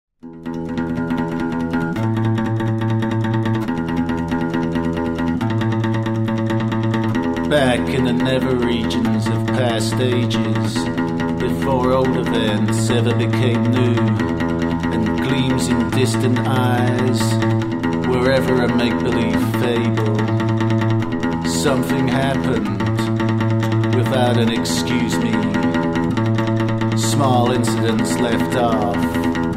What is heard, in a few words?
Caustic Acoustic Intro Bit; Extra Choonz